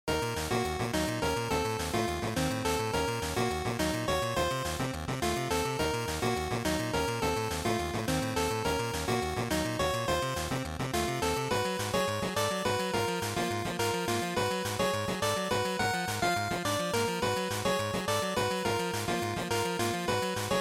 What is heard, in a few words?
GB RPG Battle2